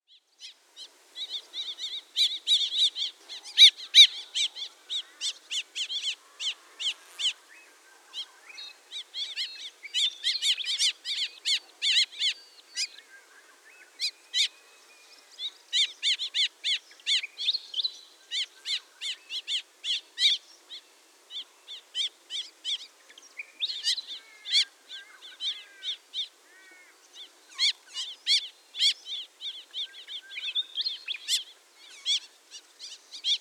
White breasted Woodswallow Artamus leucorynchus, white breasted wood swallow call